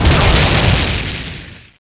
Shock Rifle Explosion

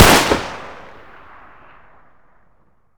m14 shot, weapons; hunger

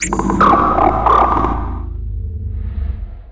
launcher idle, weapons

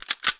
mp5 bolt back, weapons; firearms

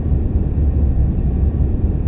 corridor ambience

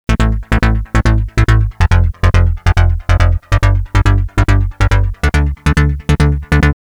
melo, party

